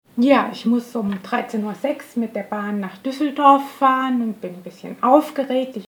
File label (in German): stimmlippe